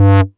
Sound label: Bass Multi Rev G; Vengeance Samples